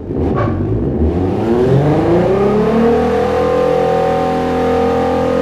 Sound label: rev, vehicles; golf3; TDMCarpack; TDMCars